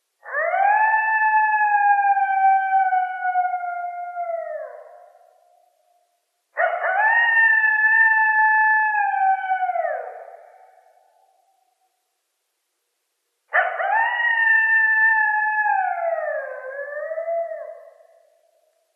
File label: Coyotes; Coyotes (Canis latrans); night sounds